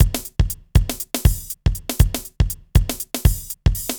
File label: Synth Loop, BPM120